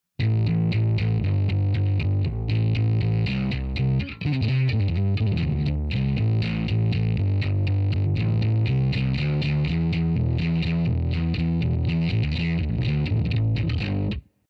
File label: bass; pod xt